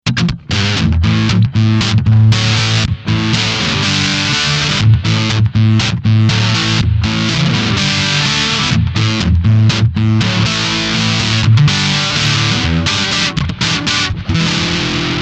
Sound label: Moderate Fuzz